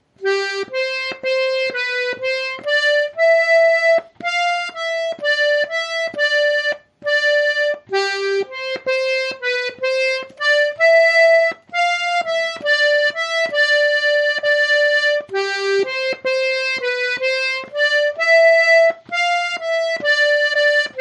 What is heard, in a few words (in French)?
Gavotte